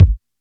warm kick drum one shot g sharp key, Royality free kickdrum sample tuned to the G# note